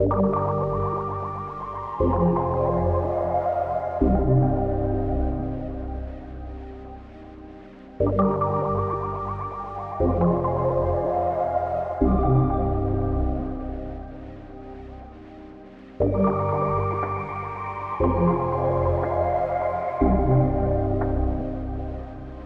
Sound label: 120 BPM G Min, Melodies; Cymatics; 2022 Melody Mystery Expansion